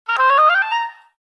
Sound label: Clarinet, notifications; LG Revolution VS910